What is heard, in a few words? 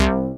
SYN Old Synth; Best Service Gigapack 1